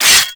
Bird Scratch; Ambience; Bird Three; Warframe; Entrati

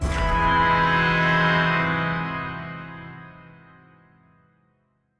Organ Open; Polluter Organ

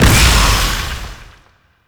Explosion Small; Weapons; Warframe; Duviri; Incarnon Adapters

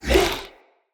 Attack Short, Vocals; Enemy; Characters; Corrupted Sproutfolk

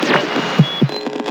Radio Static Start; Night Wave

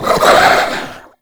Head Shake; Warframe; Stalker

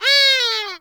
Forest Animal Cries; Warframe; Grineer Forest

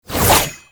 Teleport Attack; Enemies; Abilities; Warframe; Tau; Dax Ninja

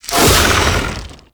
Flame Coil Ability Shot; Warframe; The New War; Archon; Amar; Abilities

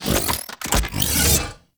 Big Slash Equip

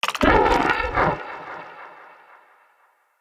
Shrimp Vocal Pain, Creatures; Vocals; Baliq Shrimp Glade

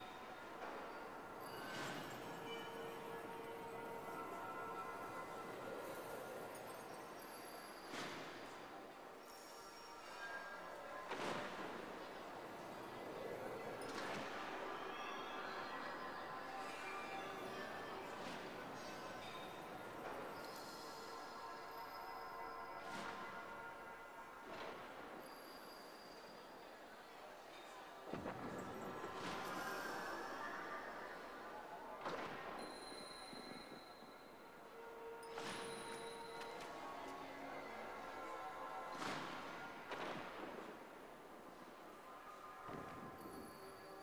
Amb; Warframe; Tau; Cinematics; MidnightOil

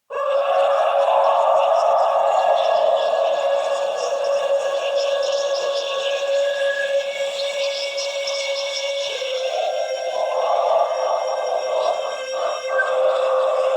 Sentient Choir; Sentients

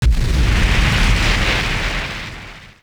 Fire Engulf, Exterior; Warframe; Awakening Remaster; Ambience

